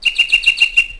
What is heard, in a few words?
birds karelia; ambient; World of Tanks Blitz